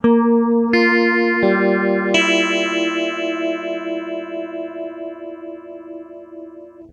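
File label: clean guitar stereo polyphase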